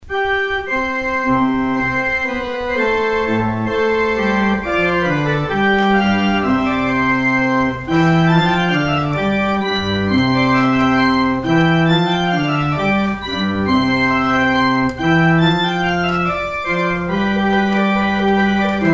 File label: organ outro